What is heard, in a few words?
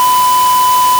Tri Osc 1000